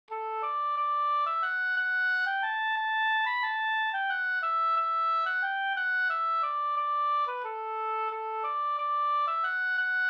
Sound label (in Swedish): vals Brudvals